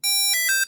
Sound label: T1000E Shutdown Sound; SenseCAP T1000-E; meshcore companion